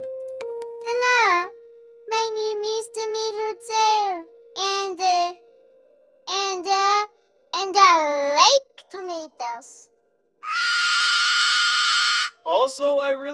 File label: bark